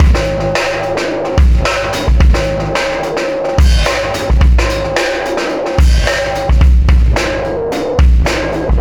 System Overload Beat, Processed Drumkits

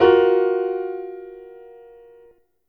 Piano Chord

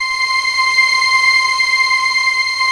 CHOR, Synthesizers

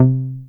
Softy Bass; Best Service Gigapack 2

